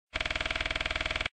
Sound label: gamble card blink; Dazzling Diamonds